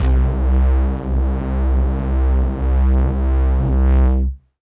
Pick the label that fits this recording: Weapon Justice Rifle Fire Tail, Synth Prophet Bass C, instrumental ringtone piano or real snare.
Synth Prophet Bass C